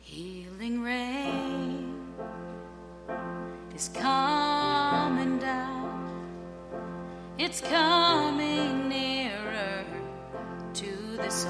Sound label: healing rain